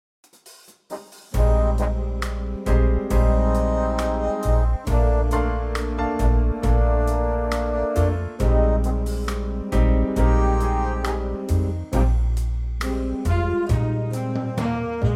Eb Big Band; key - Eb - vocal range - Bb to Eb

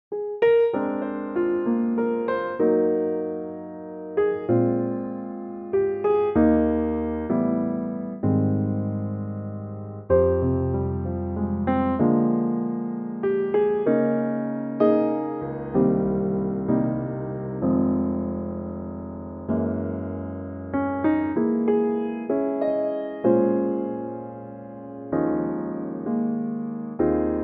Ab piano; key - Ab - vocal range - E to Ab

